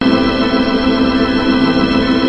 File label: big 7, organs